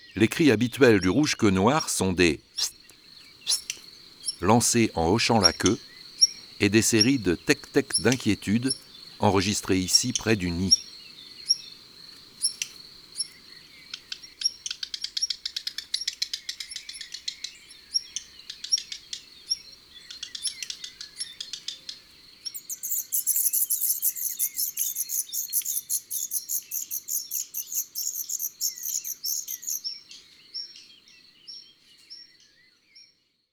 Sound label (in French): Rougequeue noir, oiseaux des jardins